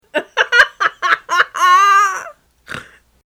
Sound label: snort